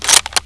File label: M3A1 Butt, weapons; dod